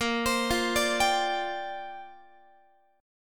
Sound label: Bb 6th Add 9th Bb Guitar; Bb6add9 arpeggiated